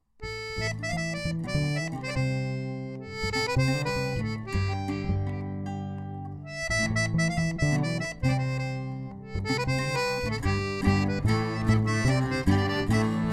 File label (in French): Plinn Bal; BalFolk